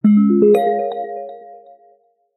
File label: Singing bowls, notifications; Realme 8